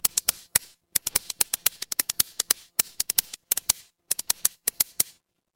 office pen

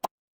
cartoon pop

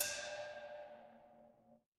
instrument gong

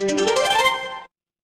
feedback level up

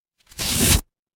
paper fabric tear

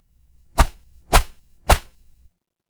paper fabric cloth whip

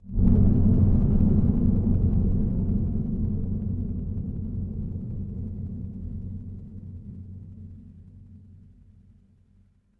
horror ambience dark

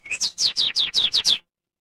animal bird

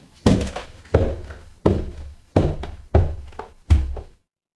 footsteps stairs

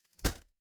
impact slam

